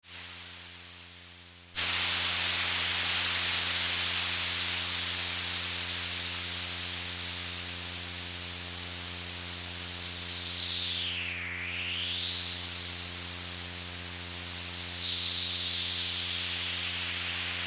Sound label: Noise Generator, Synthesizers Minisonic2; G.D.Shaw's Minisonic 2 synthesizer